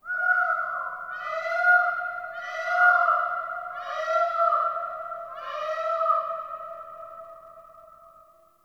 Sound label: Wetland Forest Day Creature Distant; Ambience